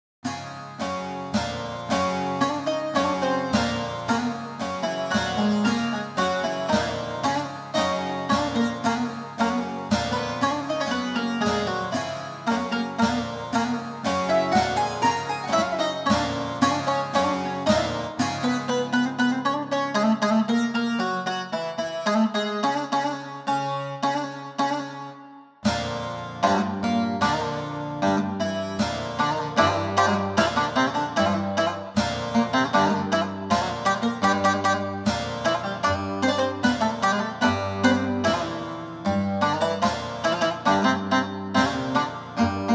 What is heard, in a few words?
saz